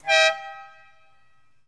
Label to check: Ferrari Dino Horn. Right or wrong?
Right